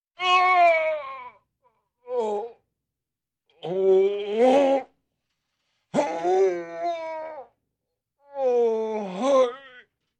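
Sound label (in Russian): ещё один мужской крик; kriki; zvuki lyudey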